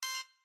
Turret Button

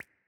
Bubble Large Pop

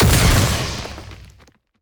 Enemy Attack Slam Hit; bloom minigame

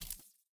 Fusebox Spark

